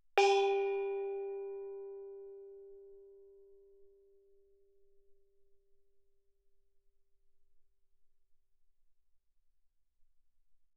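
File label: thai gong G4 fortissimo, Percussion gongs & tamtams; thaigongs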